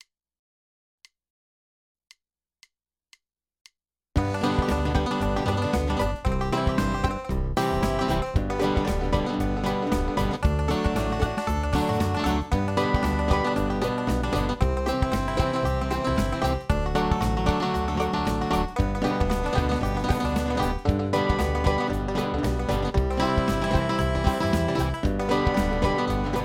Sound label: in Key of G